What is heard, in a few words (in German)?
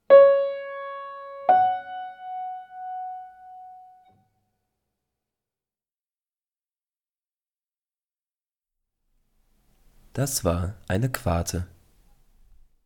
Quarte aufwaerts; interval